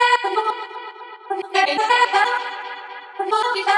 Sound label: chopped vocals ping pong house delayed Em 128